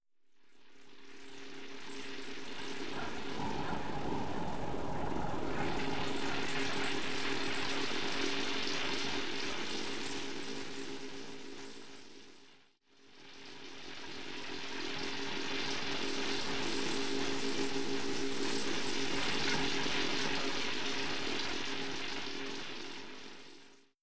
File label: insects, soundtrack; 2kki